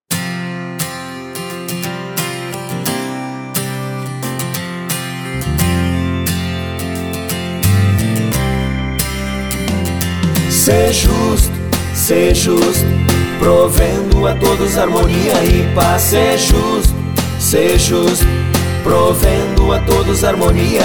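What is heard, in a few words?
Song, Portuguese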